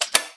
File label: Charge